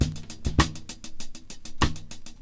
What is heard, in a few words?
breaks; montage